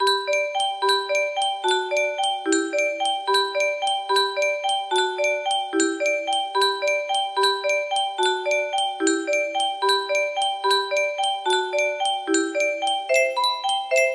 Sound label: waltz, music box melody